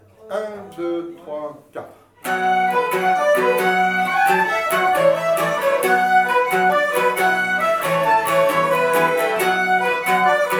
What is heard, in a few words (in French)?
tutti avec piano; lady margaret stewart